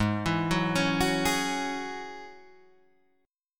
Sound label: G sharp 7th Suspended 4th Sharp 5th G sharp, Guitar Half Step Down